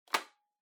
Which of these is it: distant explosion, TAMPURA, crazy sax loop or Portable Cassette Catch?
Portable Cassette Catch